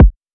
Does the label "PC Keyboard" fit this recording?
No